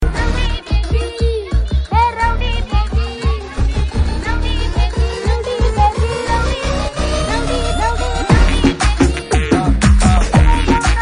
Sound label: Remix Ringtone